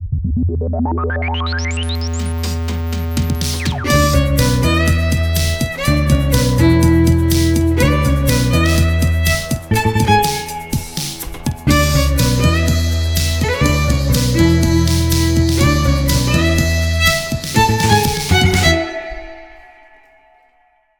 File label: jingle